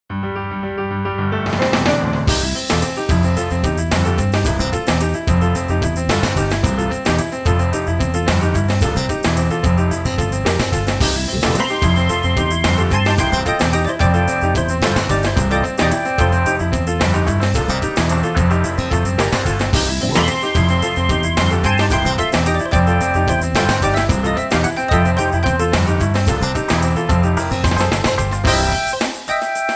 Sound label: Jazz